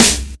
clean snare sound c key, Royality free snare drum sample tuned to the C note